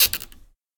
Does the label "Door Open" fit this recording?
Yes